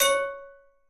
plus; rollingballs

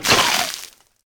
ferocious bite; battle

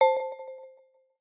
join

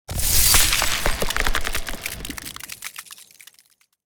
rain of ice; battle

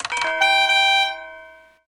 disc draw; crazyeights

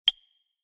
round start

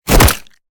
jaw punch; battle

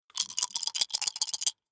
dice shake; squares

